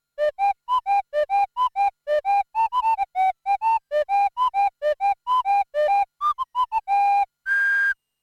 MS-20 orgue a vapeur; orgue à vapeur (dit « calliope », aux USA), très typé; MS-72 : orgue à vapeur (dit « calliope », aux USA), très typé !; Démo audio des fiches didactiques de sons analogiques détaillées dans le livre

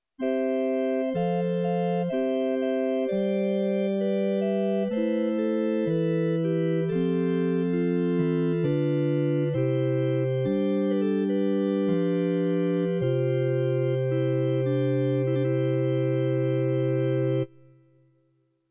SLEDGE orgue; orgue à tuyau qui sonne comme un vrai; Démo audio des fiches didactiques de sons analogiques détaillées dans le livre

